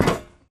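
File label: Grunt Movement Footstep Walk Metal Grate; Titanfall 2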